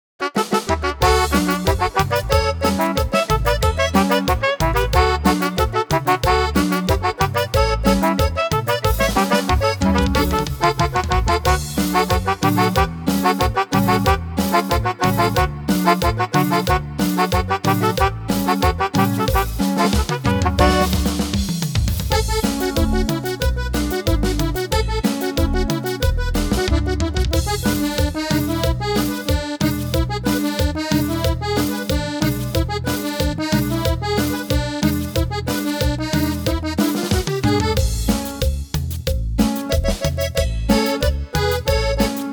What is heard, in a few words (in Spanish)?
Cumbia; Norteño